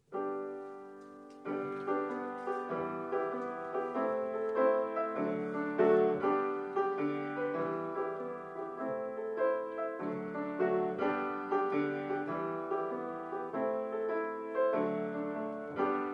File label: piano guitar